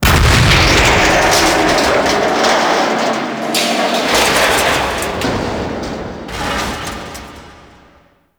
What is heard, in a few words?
Gas City End Arm Destruction; Warframe; Corpus; Ropalolyst